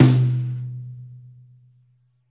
Rock Tom 50, Roland BK-5 Toms

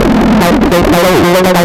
RUMBLE; ILIO; Trance Fusion

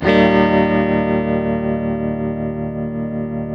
TELE CHORDS MAJ E2, Guitars; Spectrasonics; Hans Zimmer Guitars VOL-1

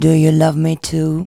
VOX VOX; Sound & Vision - Gigapack II CD 2

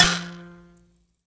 MBIRA F 3; BS MBIRA1; Heart of Africa

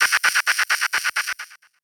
Gated Cymbal; creative gate; One Shots; musicradar

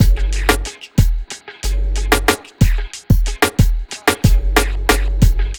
BEAT, Hip Hop; USB Soundscan vol.34; Burning Grunge; AKAI; TIMBA

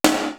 BRUTAL, TOM; East West - Global Sounds; D4 BD SD TOM

